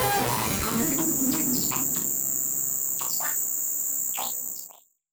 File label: Malfo Syn, malfunctioning synth